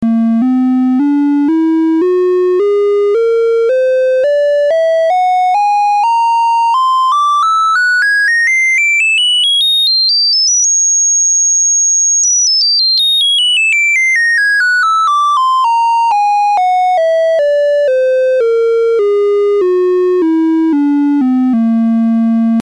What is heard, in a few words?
whole step seq knob; voltage quantizer; analog synth